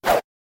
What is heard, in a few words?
swoosh; soundbible